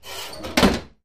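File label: Dishwasher Door Open; appliances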